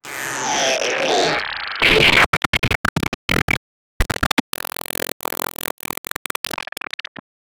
grunt like alien sounds

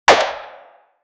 gun shot has a lot, gunshots

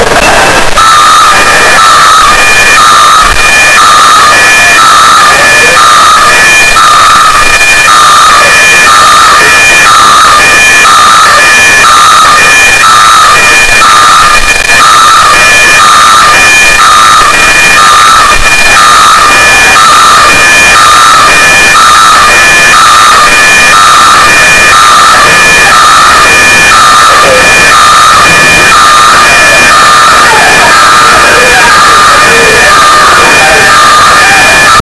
airport fire alarm; dublin airport

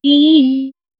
soft ding for correct ans; answer

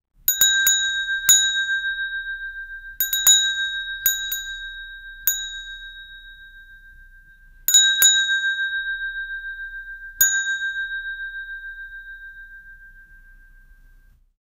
temple bell rings; building

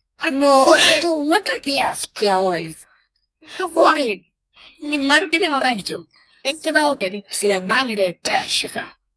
a song of, congratulations

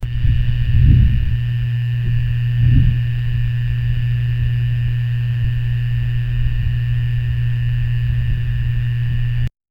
cool like, funk

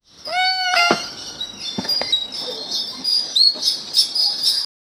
sound of elephants softly; balloon

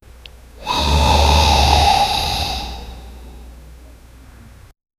the sound of breathing or, ghost

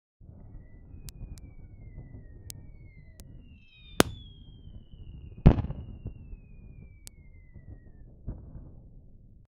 a fireball being created

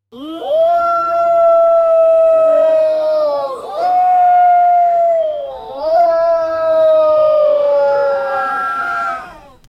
goblin yelling at

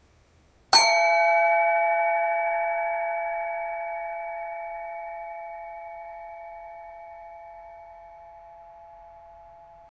a light beep for a, alerts

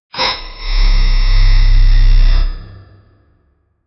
a cute robotic sigh

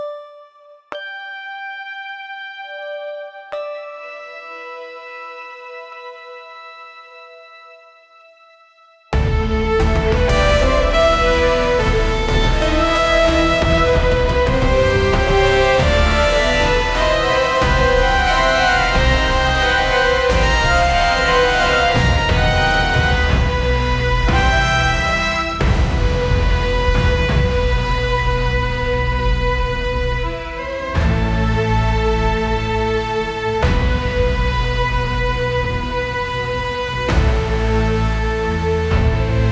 cinematic epic, buildup

